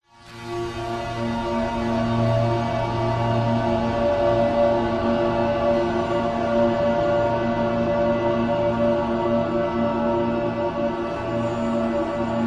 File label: horror drone atmos droning reverberant dark evil sinister; zapsplat